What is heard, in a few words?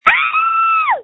Scream